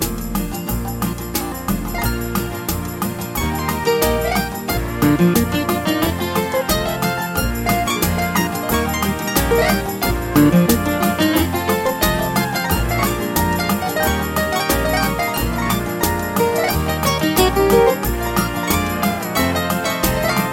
Easy Strumming

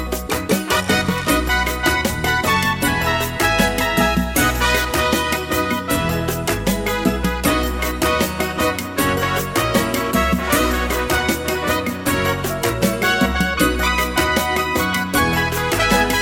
Calypso

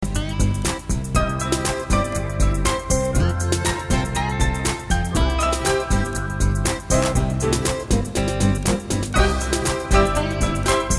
Real Oldie Rock

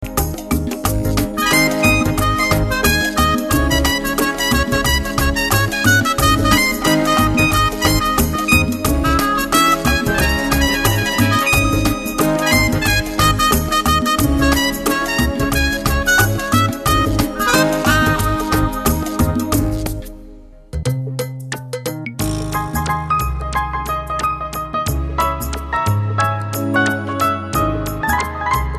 Smooth Jazz; bluesjazz

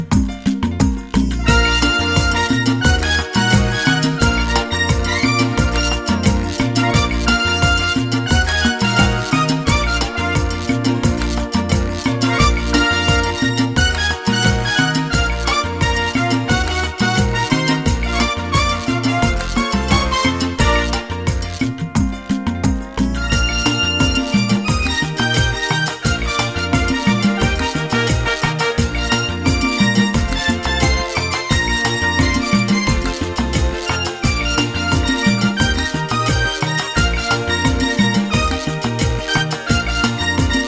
Timba

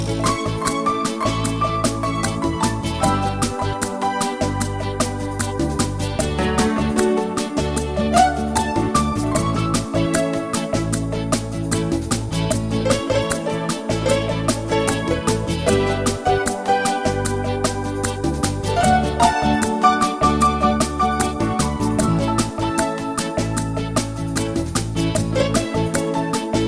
Analog Beat

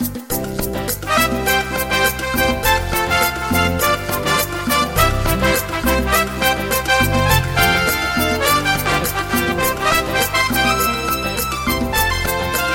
Pop Latin

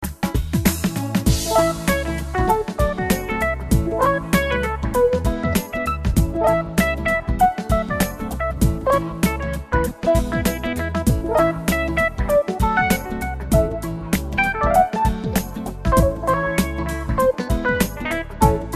Jazzy Funk; emotions

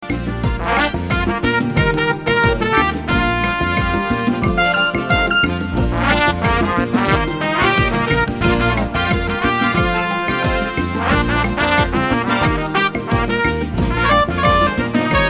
Hot Salsa; emotions

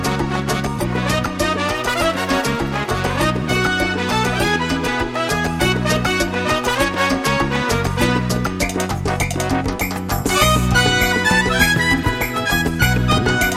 Salsa de Barrio

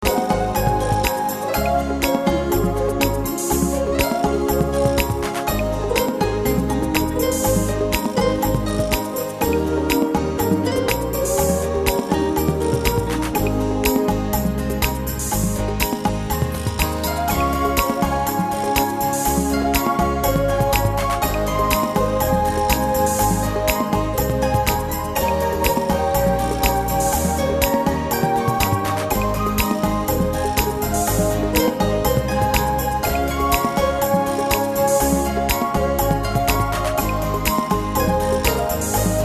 Modern Beguine

